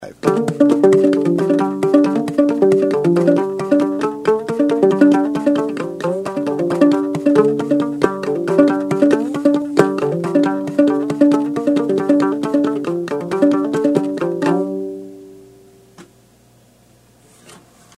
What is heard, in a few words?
banjo